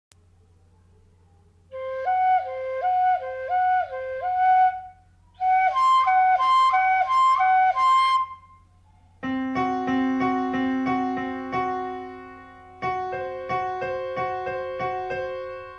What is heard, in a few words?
C4 F4 Sharp